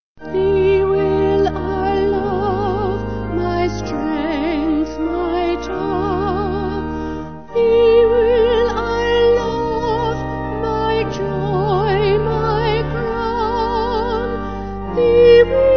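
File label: Vocal Organ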